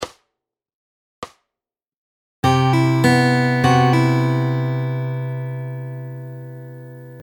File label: Fingerpicking Lick; Fingerpicking Fun